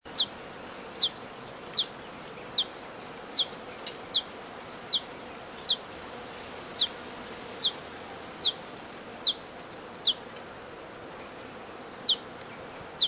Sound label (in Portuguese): canto do beija flor vermelho